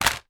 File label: household storage basket plastic folded drop down on floor